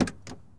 Truck Explorer Door Open; Trucks

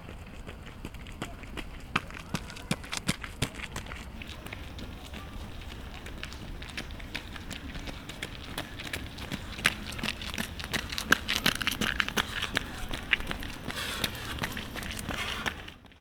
jogging park, sports; best service Blue Box

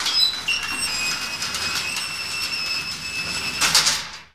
Gate Metal Fence Open; SFX Kit; Doors

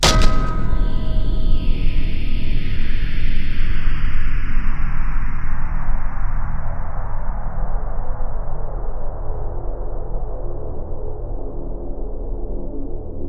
Hard Slap, Cinematic Metal Impacts

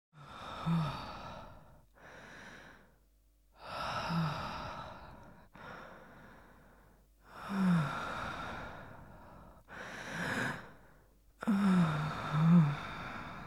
Woman Heavy Breathing; KMP Film Video Sound Effects

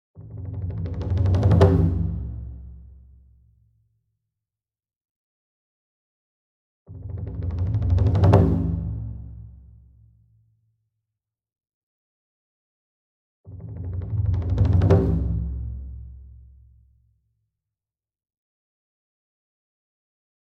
SINGLE DRUM Taiko 28 Inch Roll Soft Beater Medium; boom library; Cinematic Strikes Construction Kit

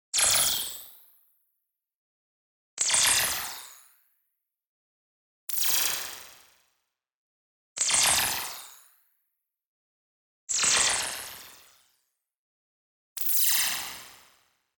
ZAP High Tech; boom library; Anime Essentials; DSGNMisc

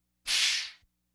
Laugh tracks

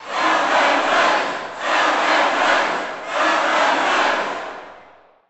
Boxing Crowd Chant; Crowds

